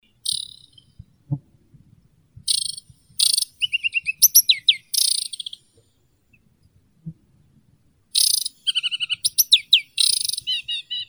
kamishovka vertlyavaya acrocephalus paludicola

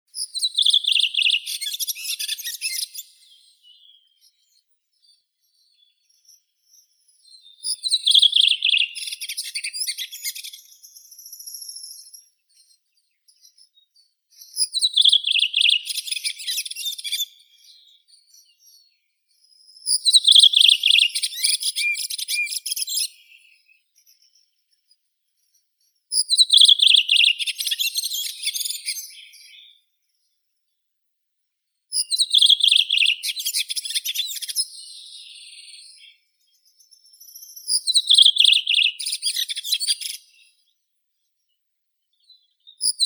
drozd belobrovik turdus iliacus

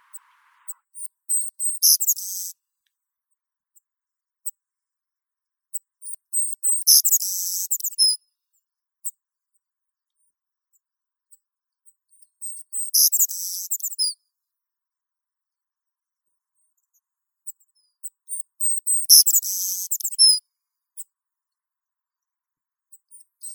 ovsyanka savannaya passerculus sandwichensis; zvuki prirodi

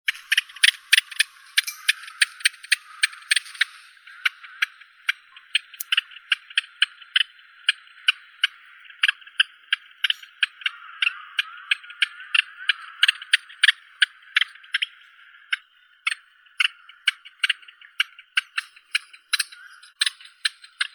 penochka tolstokluvaya phylloscopus schwarzi

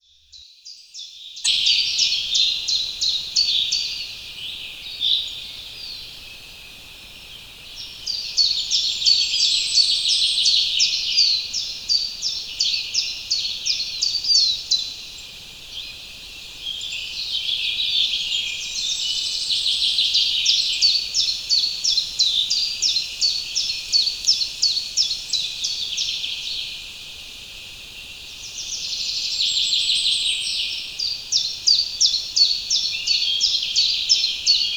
vesennii les rannim utrom

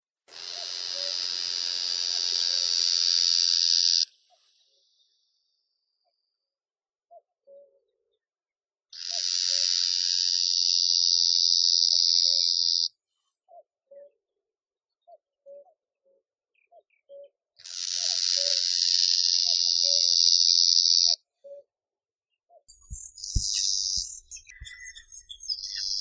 pestrogrudka sibirskaya bradypterus tacsanowskius; zvuki prirodi

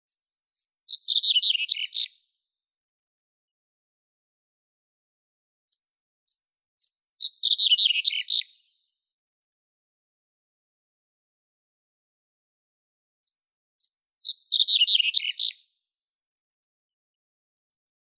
ovsyanka zhelchnaya emberiza bruniceps

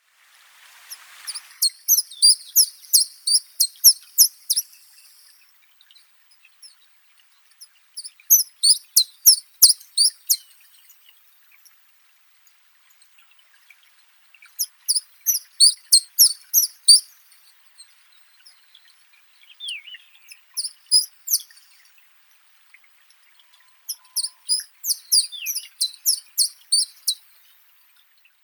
penochka tenkovka gornaya phylloscopus sindianus

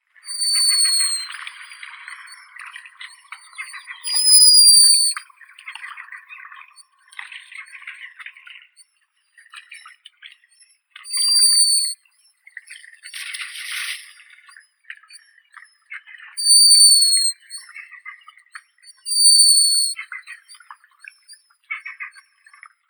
chistik cepphus grylle

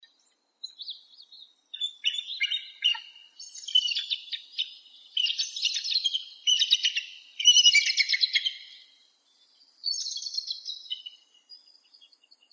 orlan belogolovii haliaeetus leucocephalus

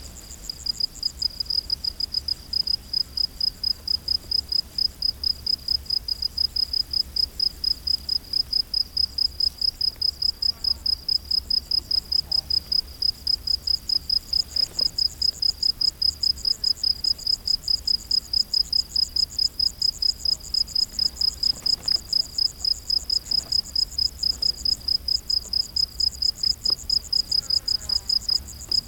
zvuki prirodi sverchki na vereskovoi pustoshi

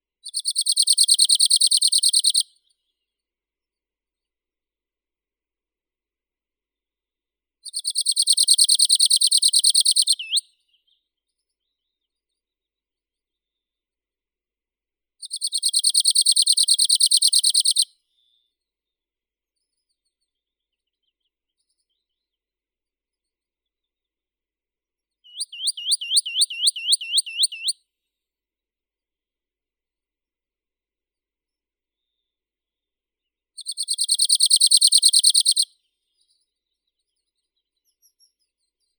penochka talovka phylloscopus borealis; zvuki prirodi

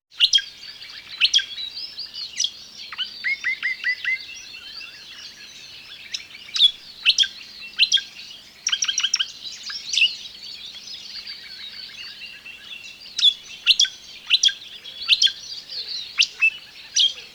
pichugi netoroplivoe tsvirkane; zvuki prirodi

